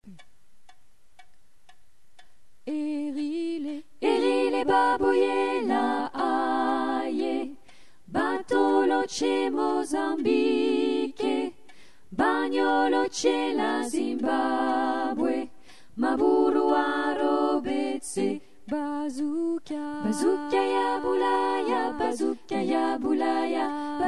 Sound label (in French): tutti